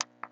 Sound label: Button